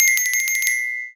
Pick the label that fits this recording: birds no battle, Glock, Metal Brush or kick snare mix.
Glock